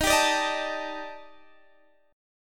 D sharp Major 7th Flat 5th, down, Guitar, Standard; strummed; JGuitar